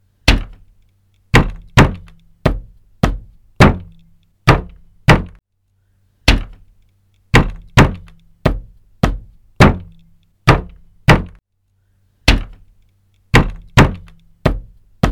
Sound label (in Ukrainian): stuk v dver